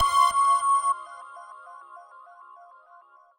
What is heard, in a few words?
synth; osu